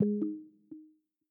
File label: Voice Control Listening; Peloton